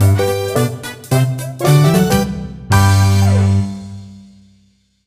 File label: Course Clear; Super Mario 3D Land; E3 2011 Beta